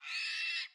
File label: ambience room butchery pigs scream